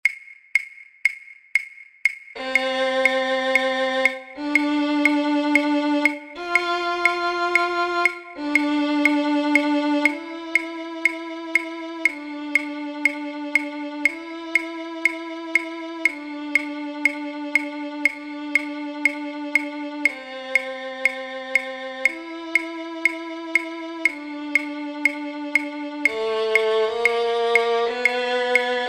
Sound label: violin 3; online orchestra